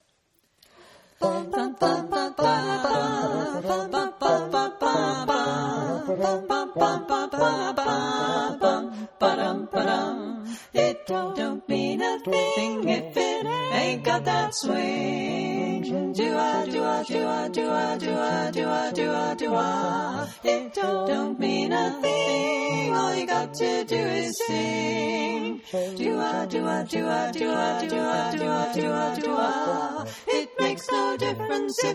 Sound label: Swing with slow ending